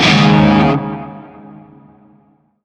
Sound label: Shawzin Yo Chord B; Warframe; Narmer Shawzin